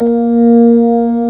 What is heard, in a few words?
ORG B3 A#3; Best Service Gigapack 2